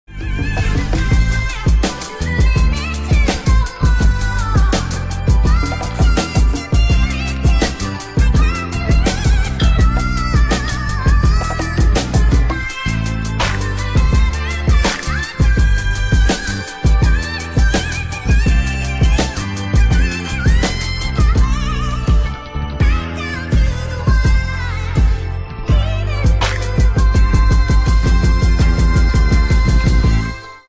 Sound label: instrumental